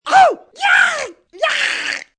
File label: Scream Yelps, Scream, male, short yelps, fear, terror (human voice)